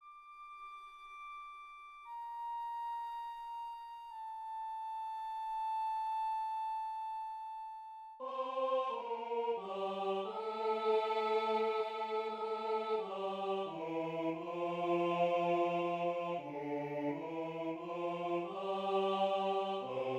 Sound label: choir flute; 1부-기도송